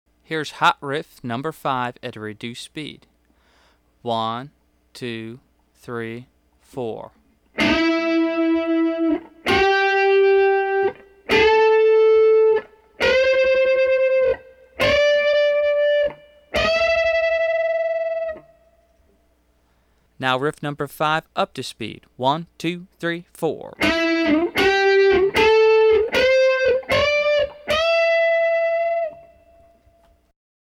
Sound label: Hot Licks Riff